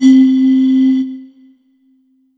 Kawai K5000W Bottle C4

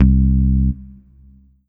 Korg N1R Bass Picker C2

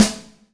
Korg TR Rack Standard Kit Snare Drum

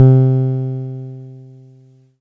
Yamaha PS-300 Piano C3

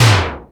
Ensoniq ESQ-1 Low Mid Synth Tom

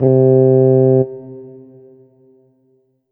Korg M1 Tuba Flugel C3

